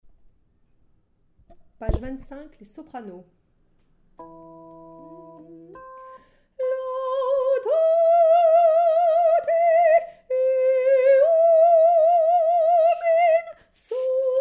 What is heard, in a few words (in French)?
psaume Soprano